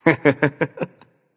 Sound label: heh heh; smite